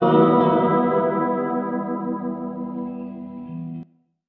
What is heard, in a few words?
guitar, stringed instruments